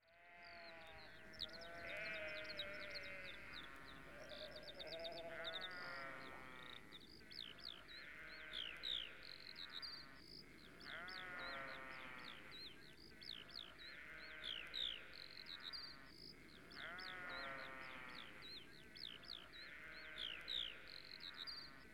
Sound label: Sheep